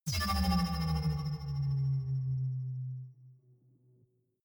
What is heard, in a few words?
energy shield shut down; energie